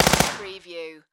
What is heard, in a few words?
Submachine Gun HK MP5